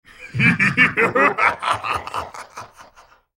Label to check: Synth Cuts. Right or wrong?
Wrong